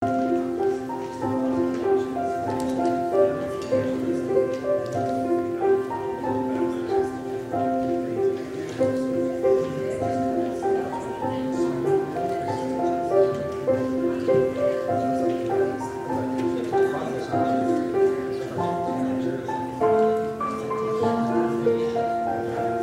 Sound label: piano